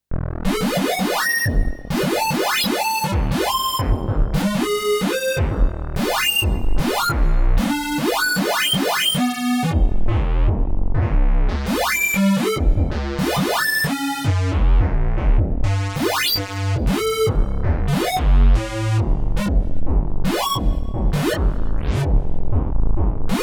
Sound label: analog synth; random midi notes player